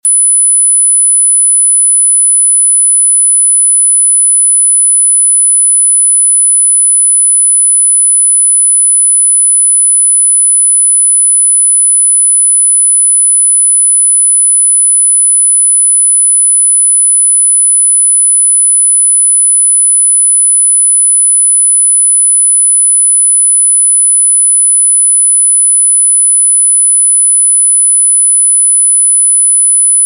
loud; voicemod

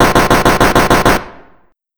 8 bit explosion gun; mixkit

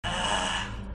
ugh

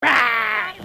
rawr

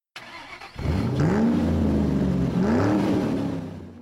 sports car engine sound effect

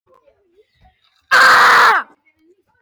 screeming

